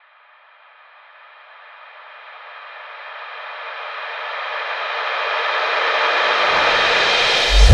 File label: Crash Kick Reverse, Cymbal; One Shots; Drums; Ableton Live 12 Lite; Core Library